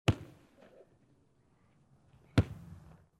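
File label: 3 kicks of a ball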